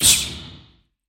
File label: anime hit; SoundsCrate